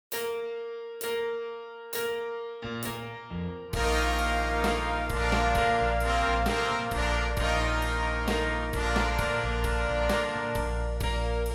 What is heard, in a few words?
Horns in Fifths